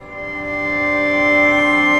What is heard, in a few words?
Cello Harmonics; Instruments; Distressed Strings; Ableton; Mood Reel